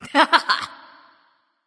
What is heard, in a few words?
heh heh; smite; terra